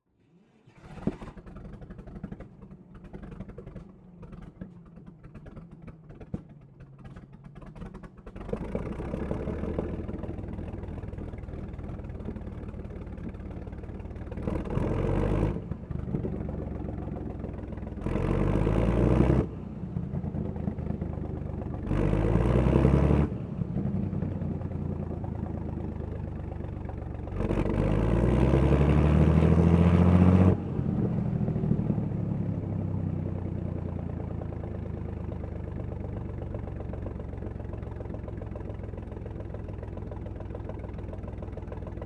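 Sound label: Char B1, Bis, Onboard, Idle, Various, Exhaust; 1938; RE20; Pole Position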